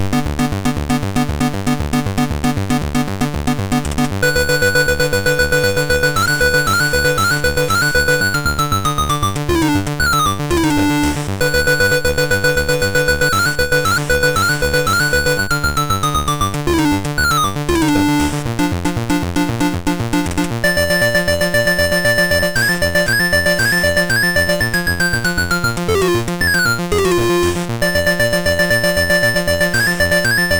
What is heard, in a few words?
Complete Computer Fireworks Celebration Kit, MOS6581R3